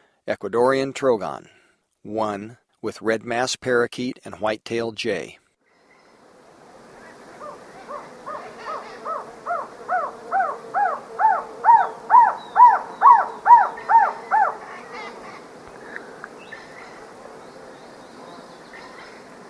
Ecuadorian Trogon

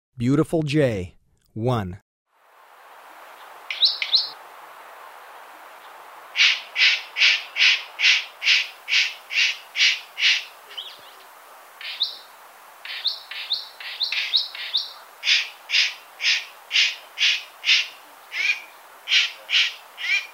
Beautiful Jay

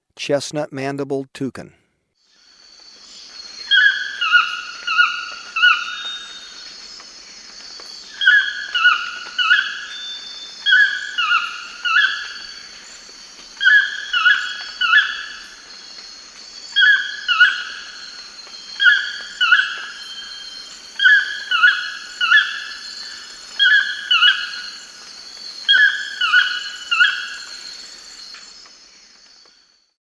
Chestnut-Mandibled Toucan

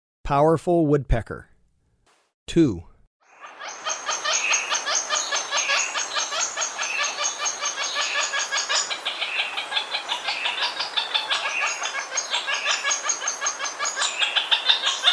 Powerful Woodpecker

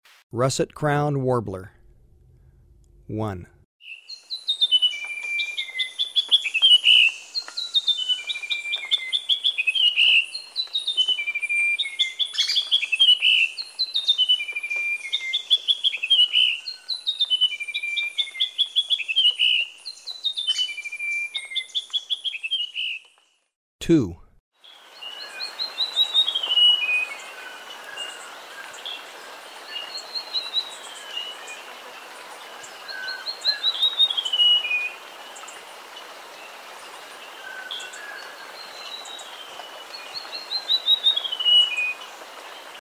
Russet-Crowned Warbler

